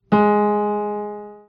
piano gs3

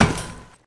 ladder, footsteps

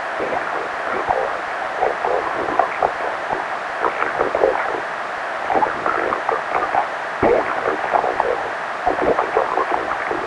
combine radio idle; soldier common

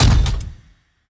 combat step, strider, steps; creatures; Half Life Model Pack

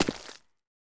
mud, footsteps; player

